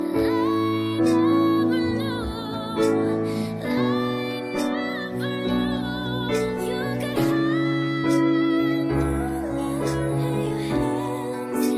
Moonlight Acoustic, ringtone

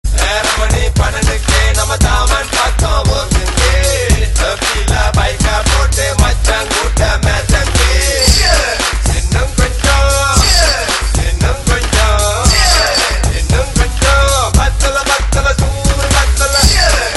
cheers, hip hop ringtone

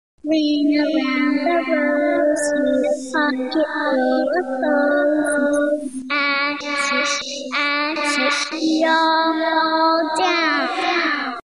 ring around, ringtones children

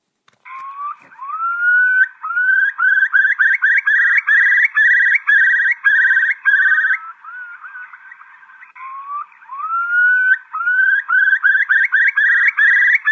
Curlew sound

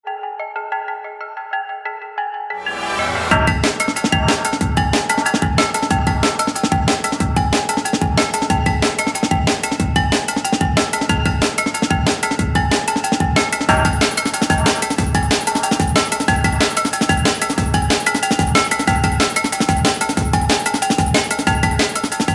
drum n bass

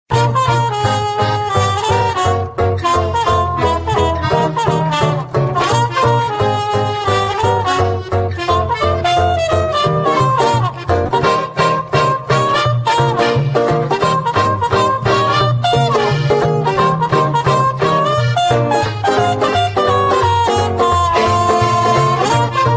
jazzy comedy

